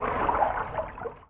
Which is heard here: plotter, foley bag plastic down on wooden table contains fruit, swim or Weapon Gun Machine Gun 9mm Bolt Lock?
swim